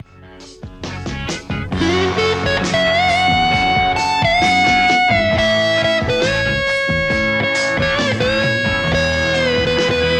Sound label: les paul; mercuriall tomato v1 0